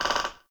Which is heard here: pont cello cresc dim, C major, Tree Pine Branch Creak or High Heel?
Tree Pine Branch Creak